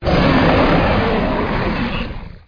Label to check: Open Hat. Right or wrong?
Wrong